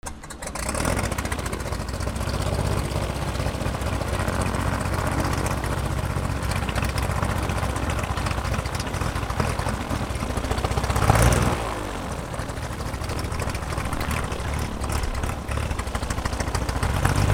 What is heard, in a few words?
flight